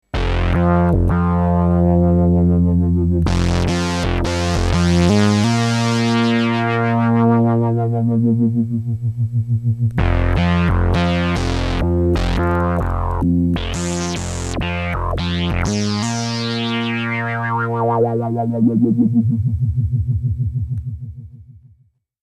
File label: analog filter q3